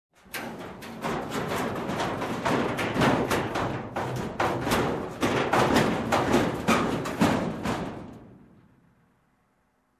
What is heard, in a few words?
Sliding Metal doors close